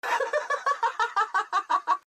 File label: Laugh; Baekho